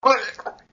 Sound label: Gag; Lewd SFX